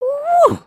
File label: breakdance woot up, emotes